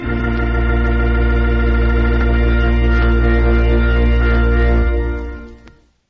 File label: american organ; Notes